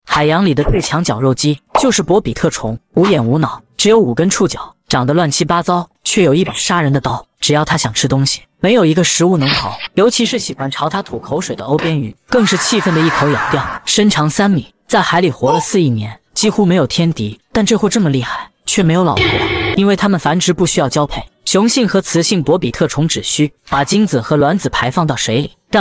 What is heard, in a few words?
vocals